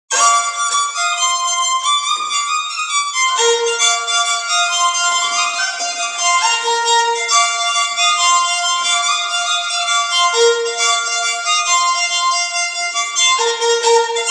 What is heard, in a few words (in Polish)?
skrzypce